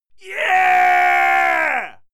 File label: male fight scream yeah; menschen; schreie